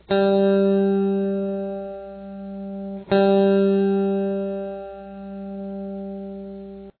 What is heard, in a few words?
string g, guitar